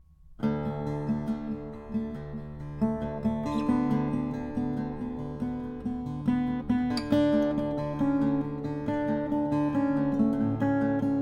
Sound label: lowden s50 custom bastogne walnut, Guitars Acoustic; part of Lowden's Top of the line 50 series